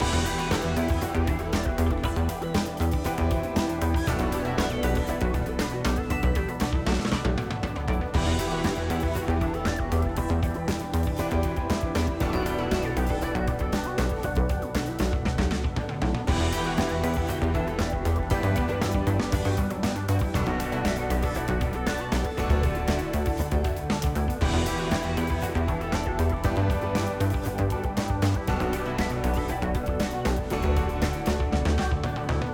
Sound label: ambience Respin; starburst